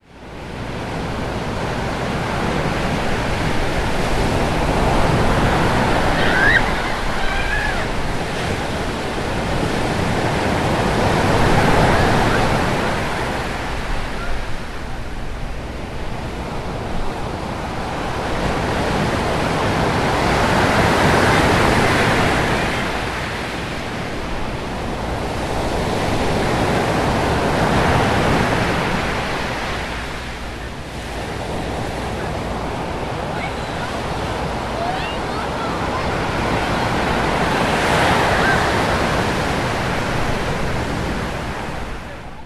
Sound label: Beach Sounds